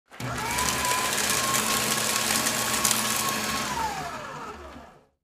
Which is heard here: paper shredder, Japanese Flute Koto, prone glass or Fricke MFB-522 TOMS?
paper shredder